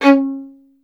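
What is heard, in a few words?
STR violin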